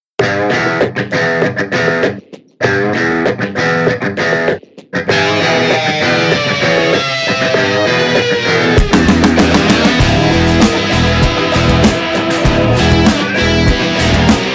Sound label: Dry Drums; Opener Mix